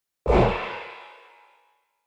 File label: Metal Explosive Impact; Miscellaneous Impact Sound Effects